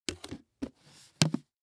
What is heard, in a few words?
Household Container Close Lid Plastic